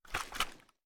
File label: inspect; gmod; viper